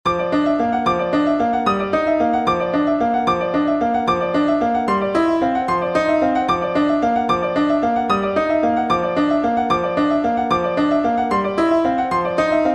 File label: piano miniature